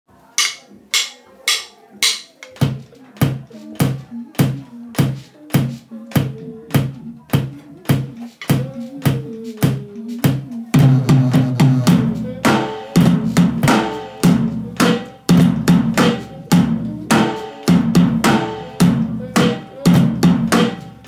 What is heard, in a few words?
drum part